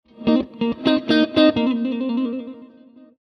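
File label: Ambient Gtr B flat Min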